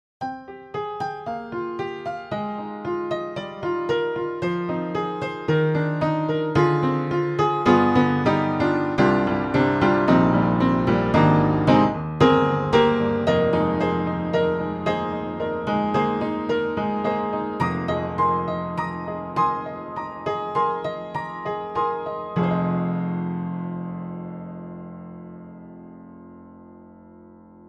Upright Pianos Vintage Upright Vintage Upright Piano no rel; Synthogy; Ivory II